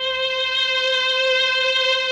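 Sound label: MELLOSTR, Mellotron Violins; Sonic Reality; Vintage Timetraveler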